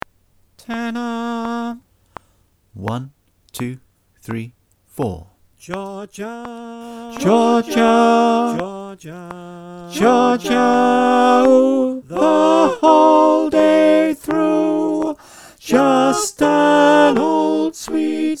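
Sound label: tenor clicktrack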